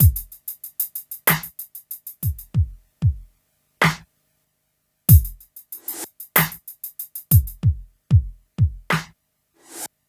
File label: Smooth, R&B Drums